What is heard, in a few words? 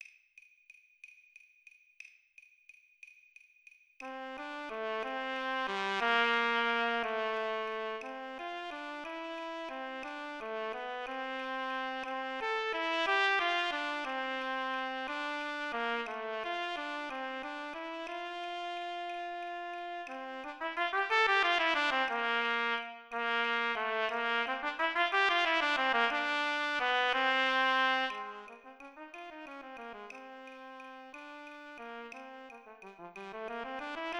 Trumpet Play Along 60 BPM